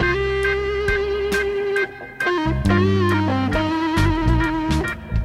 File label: guitar, Sad